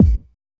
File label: smooth bottom end kick sound A key, kick drum single shot